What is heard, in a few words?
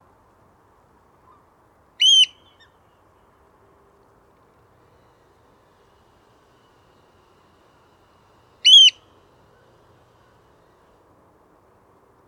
Oystercatcher, Blyth Estuary; non passerines